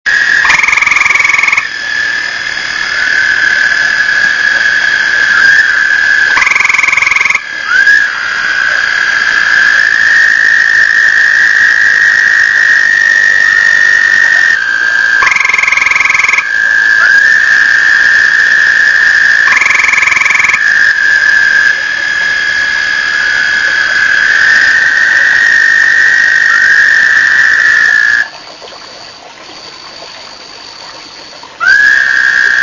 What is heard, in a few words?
toads